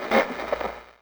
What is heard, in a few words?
Jukebox Switch; Zomboid; Lifestyle